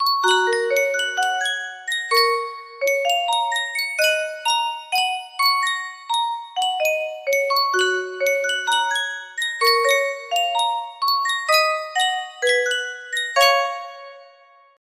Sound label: sankyo music box, music box melody